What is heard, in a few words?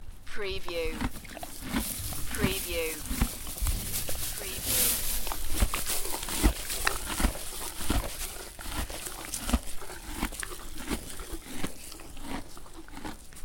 Horse eating munching hay